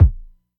deep kickdrum sample d sharp key, kick tuned to the D# note. Loudest frequency: 98Hz; kick drum samples